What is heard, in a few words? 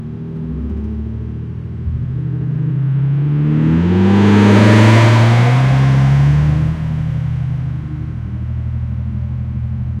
a sports car driving stea